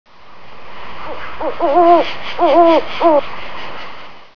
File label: owl